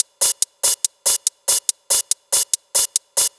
ACID TECHNO

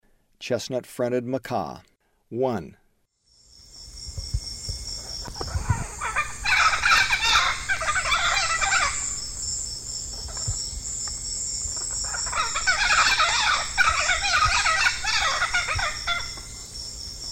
Chestnut-fronted Macaw; S. America; Eastern Lowlands

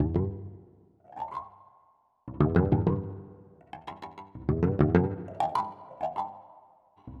Planet Tracks, Minimal Planet Drums; Ambient Cinematic

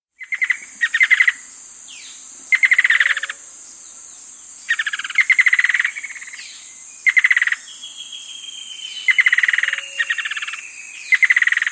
Black-And-White Antbird; S. America

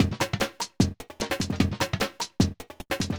phewstep 150

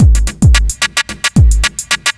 block rock kit

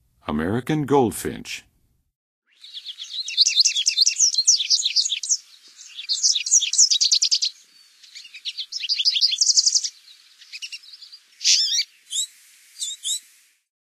American Goldfinch; North America; Western USA; Western USA Songbirds

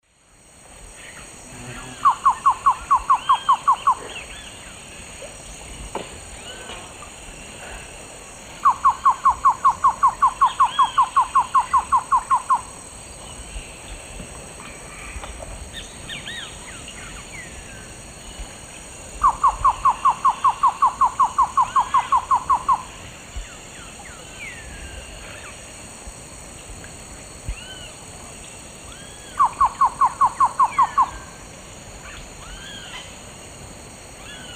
Thamnophilus praecox, song male; S. America; Eastern Lowlands

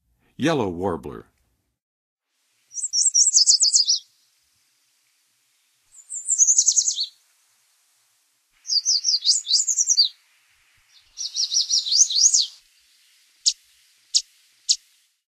Yellow Warbler, Songs of Birds of North America; Western USA; Western USA Songbirds

